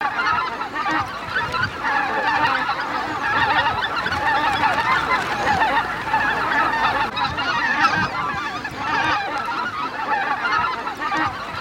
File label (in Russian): Белолобый базар Oche Lombardelle